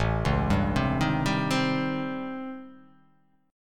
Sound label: A 7th Sharp 9th Flat 5th